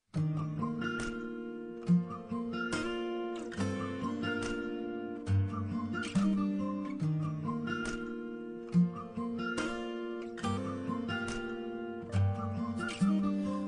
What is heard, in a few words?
acoustic; holiday